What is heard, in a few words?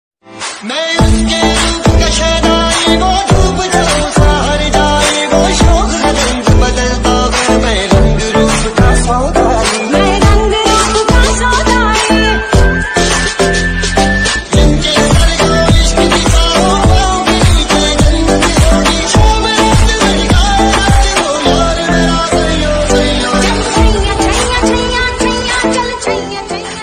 ringtone, bgm ringtone